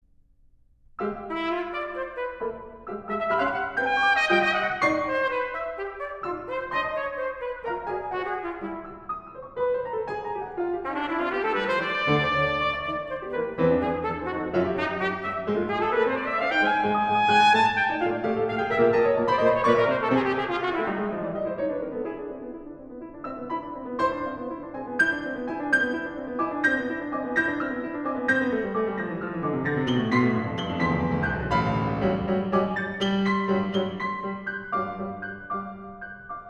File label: Sonata for Trumpet and Piano Frenetic and Frenzied